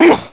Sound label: pain zombie